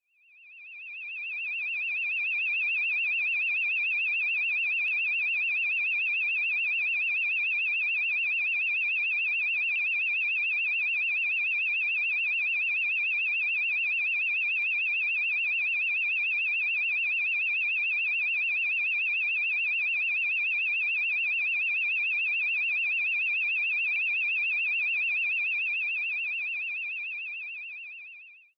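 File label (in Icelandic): flöktandi viðvörunarhljóð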